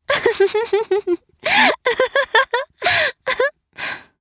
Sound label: laugh; Eternal Return; Garnet; ENG